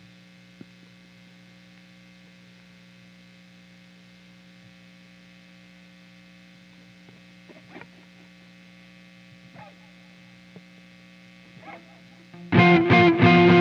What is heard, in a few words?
guitar